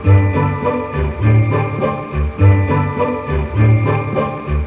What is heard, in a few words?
jingle; soxmas